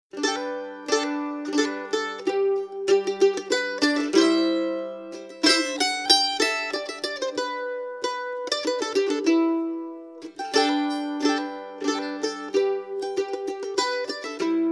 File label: dixieland